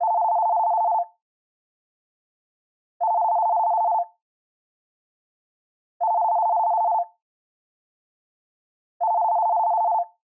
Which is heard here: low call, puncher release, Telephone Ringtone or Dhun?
Telephone Ringtone